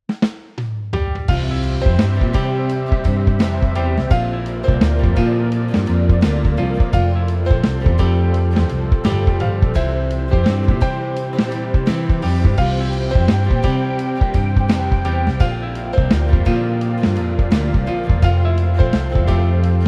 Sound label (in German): JINGLES Telefonwarteschleife